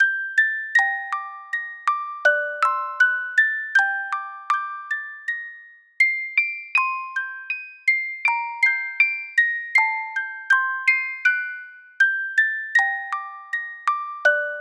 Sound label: Sonata, Music Box; animal crossing new leaf welcome aamiibo soundtrack